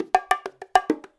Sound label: FILL, Percussion; InVision Interactive; Percussion Slam; SULTAN